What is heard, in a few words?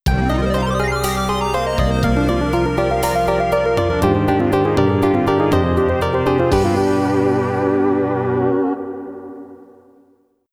GAME OVER [Roland LA]